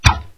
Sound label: Arrow Fire